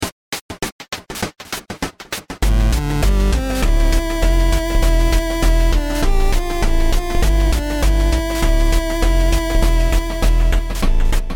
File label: deeper sea, Underwater chiptunes